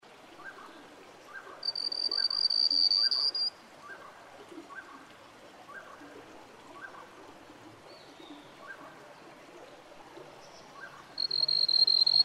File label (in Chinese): 鉛色水鶇; 鉛色水鴝、紅尾水鴝; 鶲科/留鳥